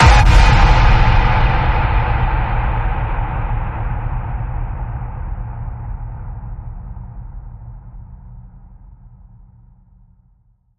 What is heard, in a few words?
Radioactivity Ping